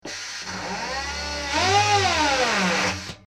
Drill Inter Barrel, servos

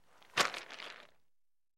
Ice Bag Movements Ice

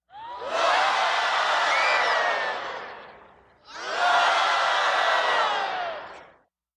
Theater Crowd Bigger

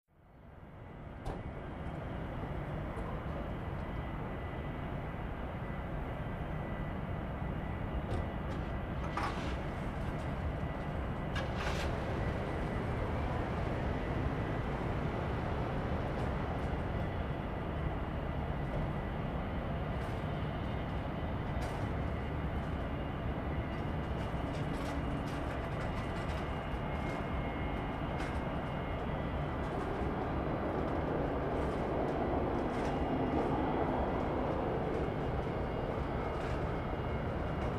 Airport Amb; mccarran; ext airport

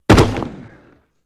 Hockey Player Hit

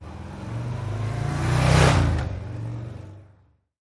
Harley driveby

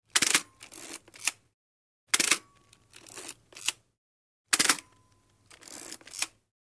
Antique Camera Shutt, shutters; shutters & movement

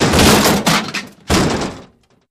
Metal Impact Crunch

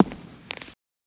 FS Male On Hardwood, singles wood

